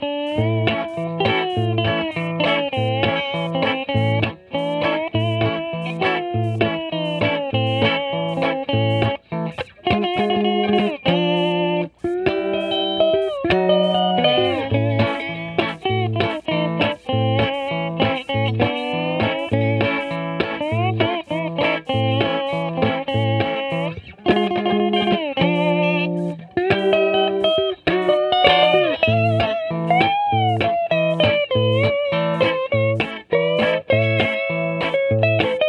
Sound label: guitar; SpongeBob's Next Big Adventures